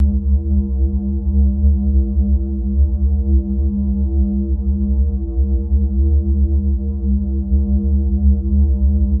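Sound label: Starship Prophet Turbine Synth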